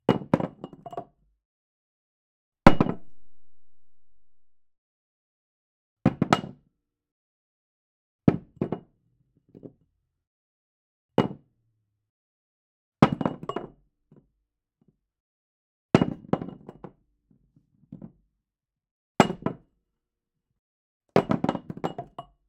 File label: mug dull wood surface drop series; fvtt sonniss gdc audio